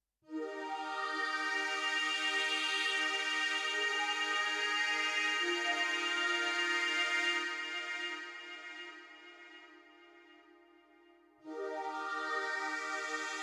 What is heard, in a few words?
OB Fat Pad Timbre